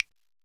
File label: Thumbs, Percs